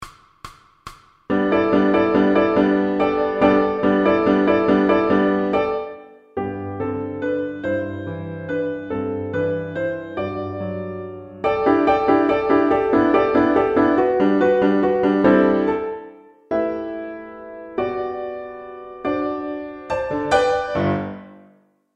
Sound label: VLN 142; Pre-Grade Higher; piano accompaniments